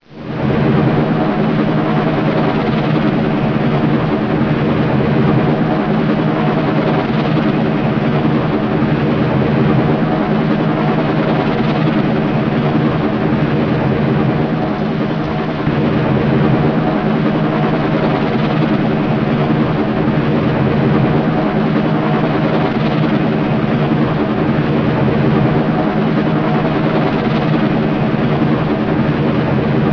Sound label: heli; race into space